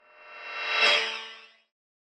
pang short R to L, Cinematic Swoosh; 3maze

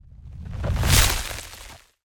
MAGIC ICE Whoosh, Ice Bloc, Debris; Articulated Sounds; Magic Elements vol.1

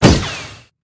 Bullet Impact

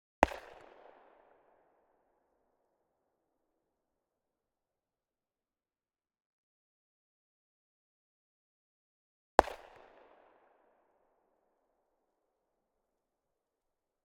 Smith & Wesson 60 FIRING 100m In Front Off Axis; Pole Position; Smith & Wesson .38 Chiefs Special Model 60; ORTF; MKH8040

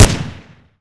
smg fire, weapons; hl2

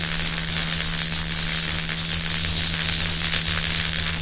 electric loop, electric trap; hl2

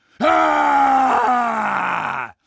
scream long, combine soldier; hl2

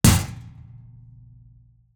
Metal Plate Hit; SoundBits

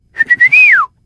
whistle; barney; e3 street; hl2

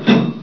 ladder, player; hl2

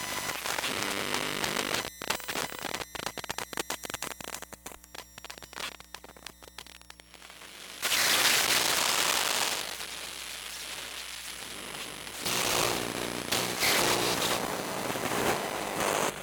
Lost Transmissions Malfunctions; SoundMorph

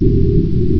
physgun loop, weapons; hl2

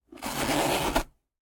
Zipper, Bag, Waxwear, Fine, Close, Warble; Household

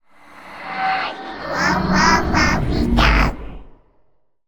Monsters Dead Baby Talk

